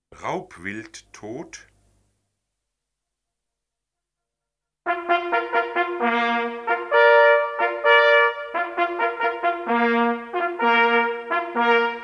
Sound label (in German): Raubwild Tot, jachthoorn